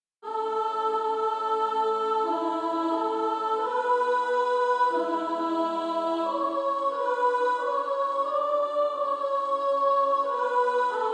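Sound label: Female Choir, soprano 2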